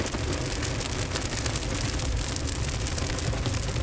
Sfx Leaflight; Levels Hub Bayou; Draw Leaflight; Sfx Leaflight Sizzle

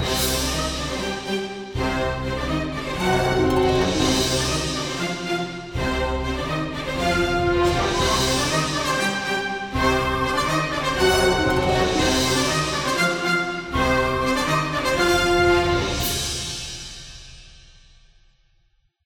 Military Melody Main; tintin reporter cigars of the pharaoh

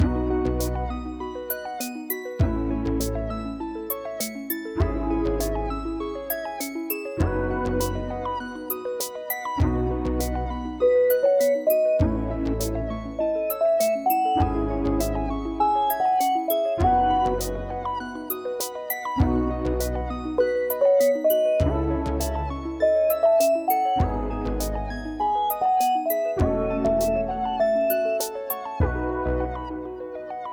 Loop High energy 100bpm; Thrutrain; jenny leclue detectiv

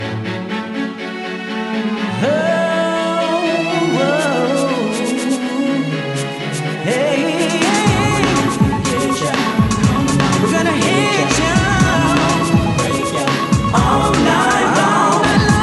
Ditty; ESPN NFL Primetime 2002

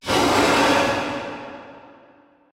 Sword Drag

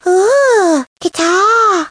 Sound guitar

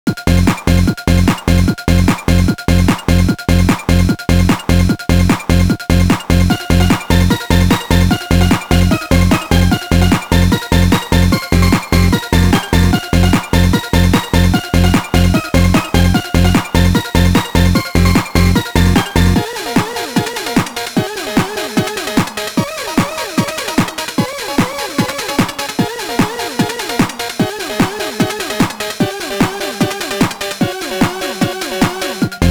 Dance; SpongeBob SquarePants Lights, Camera, Pants; GBA

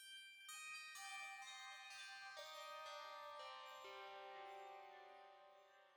pling C minor sharp 11

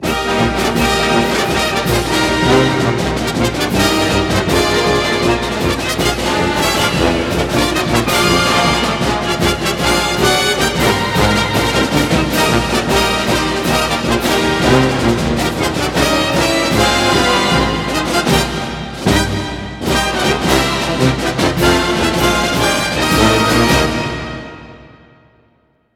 Broadcast Fight Song

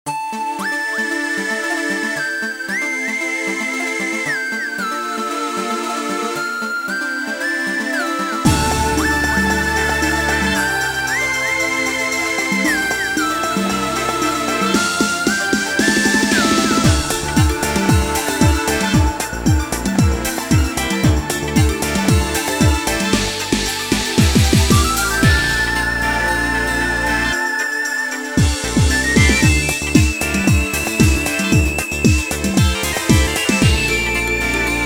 asian, UK underground music; beatmania 6thmix

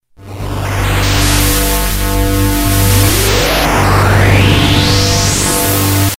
Music Generic Transistion

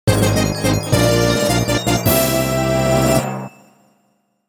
Star Stamp; Mario Party 3; N64

